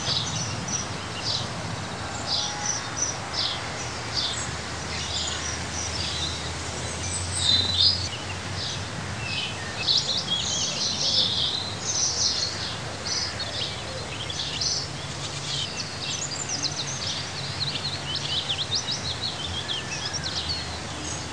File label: ATMO; TKKG 2